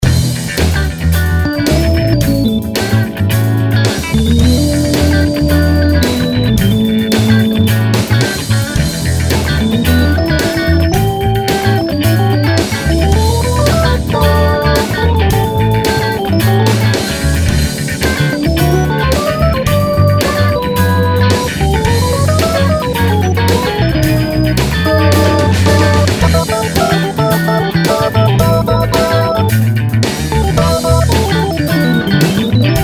In E 110 Solo Keys; Blues Solos

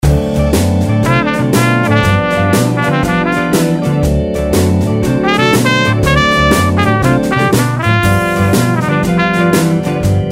Chicago Blues In D 120 Solo Trumpet; Blues Solos

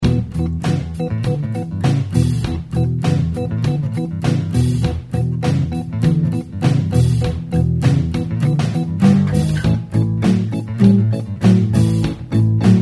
Drivin Blues In E 100 Solo Keys; Blues Solos

